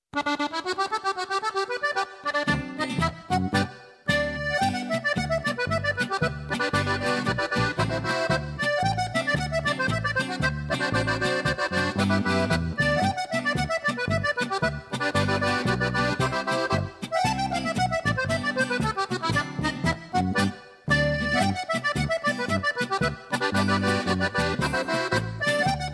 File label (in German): Akkordeonspielereien Warm up Polka